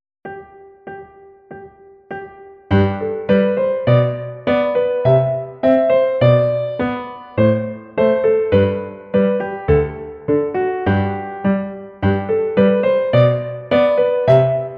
Burlesq G-Dur mittel; Mozart Leopold

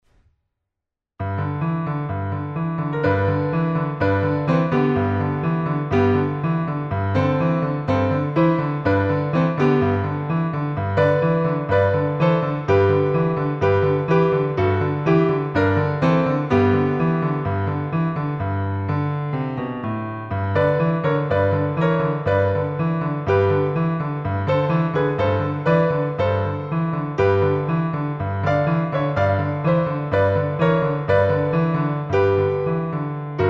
Aunt Rhody Boogie

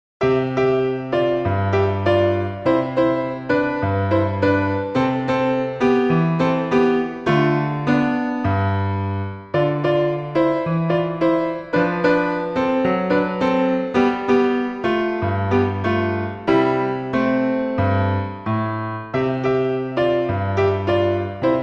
Samba; Tastenkrokodil

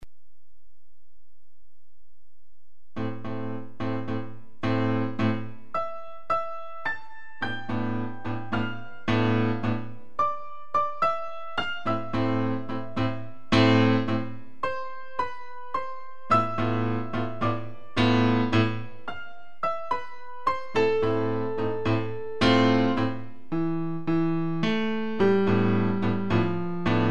Tango 108; Seiber; Rumba Bis Walzer

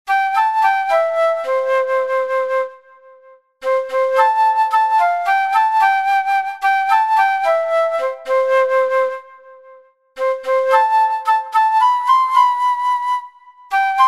Flute

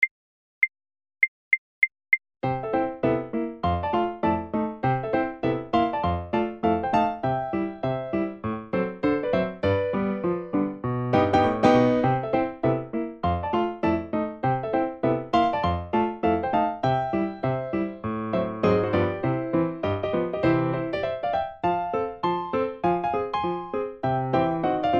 Foxtrot; Seiber

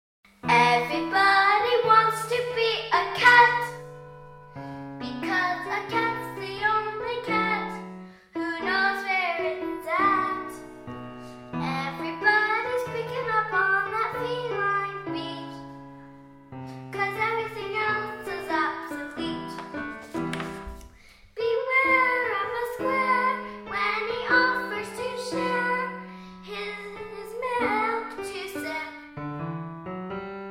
With Piano

